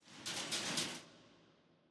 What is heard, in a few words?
ambience room torture cage